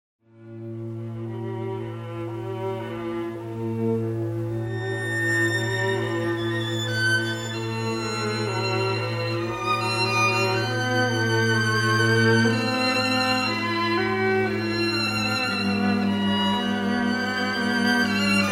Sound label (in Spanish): Nocturno del Cuarteto en Re M; BORODIN